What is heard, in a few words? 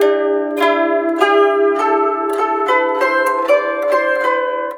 SITUR, SITUR PH; Spectrasonics; Heart of Asia